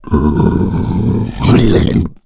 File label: idle, hulk